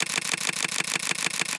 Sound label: Camera autoshutter; Huawei Ascend G510